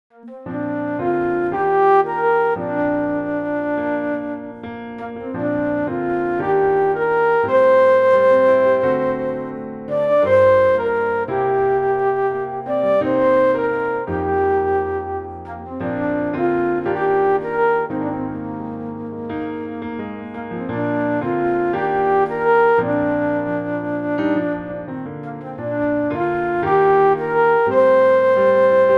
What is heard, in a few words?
Alto Flute; Sonoma Breeze